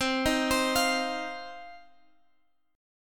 C Minor Double Flat 5th, Guitar, Open G

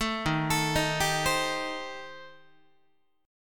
D sharp 6th Flat 5th, D sharp, down, Guitar; arpeggiated; JGuitar

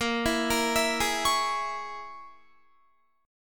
Bb Minor 7th Flat 5th, Guitar; Bbm7b5 arpeggiated

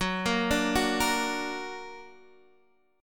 Bb Augmented Gb

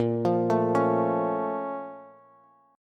Bb Minor Major 7th, Bass; BbmM7; arpeggiated; 18,20,19,18; JGuitar

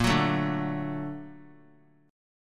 Bb Augmented Major 7th Bb; Bb+M7 strummed; JGuitar

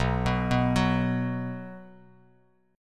B 5th B down Bass Standard; B5 arpeggiated; chord shape; JGuitar

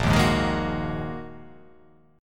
A sharp 7th Suspended 4th Sharp 5th down

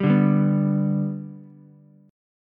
G Major G down Ukulele; G strummed; JGuitar

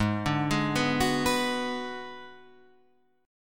Ab Minor 7th Flat 5th Ab, down, Guitar, Standard; arpeggiated; JGuitar

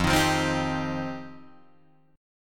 F 7th Suspended 2nd F Guitar DADGAD; F7sus2; strummed; 3,6,3,5,6,5

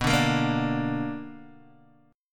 B Minor Major 7th, Guitar, DADGAD

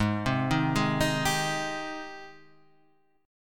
Ab Major 7th Ab down Guitar Half Step Down; AbM7

